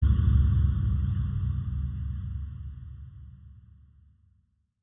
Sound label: LOW END IMPACTS; LOW END IMPACTS VOLUME ONE by ROHHSA